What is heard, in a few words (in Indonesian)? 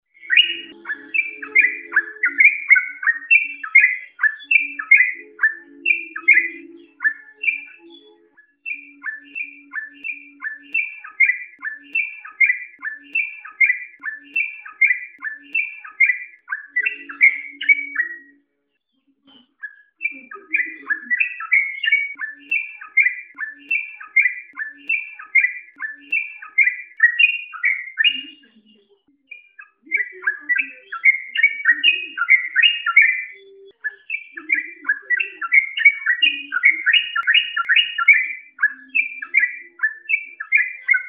trucukan ropel